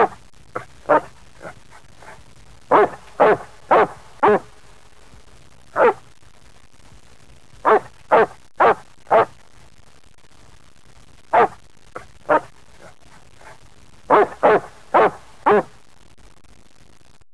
DOG BARK

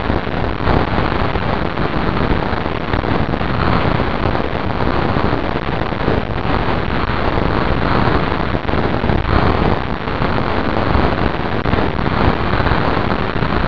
FLAME

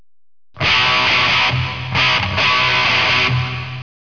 riff

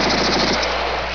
FIRE

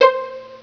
pluck; Windows

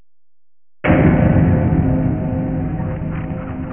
bat

